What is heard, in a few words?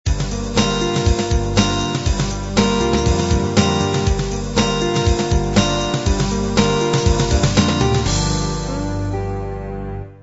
uk pop end